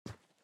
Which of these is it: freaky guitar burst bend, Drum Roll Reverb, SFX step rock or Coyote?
SFX step rock